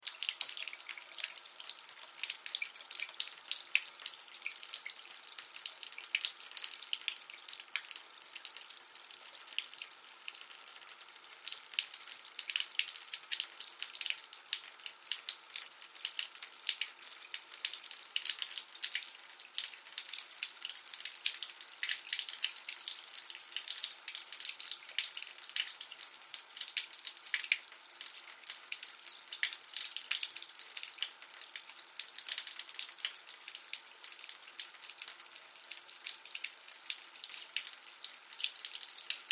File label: Shower Drips Faucet, Shower Drips Faucet Run Light; Water Shower Drips Faucet Run Light; Bathroom; Household; Blastwave